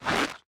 movement step; smite; odin

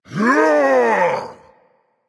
battlecry berserker; cuchulainn

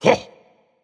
surprised, dragon; fafnir

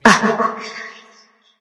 surprised light; smite; voicepack; hel

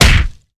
melee impact; smite; vamana

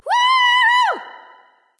yay; bellona

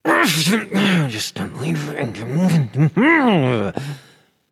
grumble; smite; voicepack; osiris

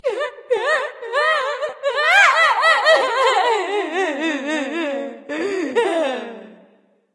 boohoo; smite

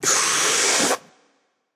breath, voicepack; smite; jorm

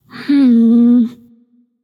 thinking light; smite; voicepack; hel

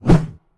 whoosh; Smite; god Lancelot

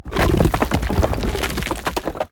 roll rubble; smite; god geb skin7

